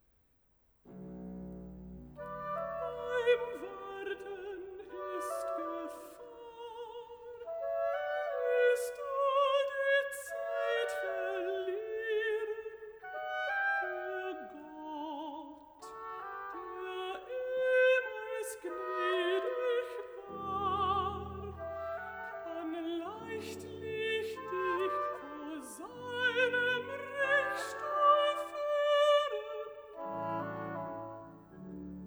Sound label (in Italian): Cantata, Recitativo; BWV 102 'Herr, deine Augen sehen nach dem Glauben!'; Bach; Braunschweig; 10th Sun After Trinity